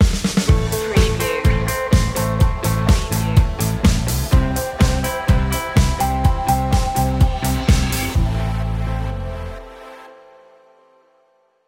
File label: night driving stinger